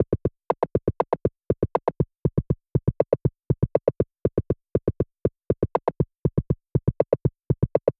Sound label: Percussion, Trance NRG, Drums